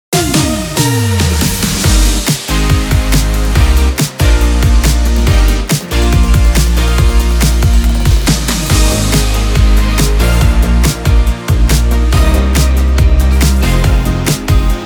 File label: Morning Opener; Hamburg 2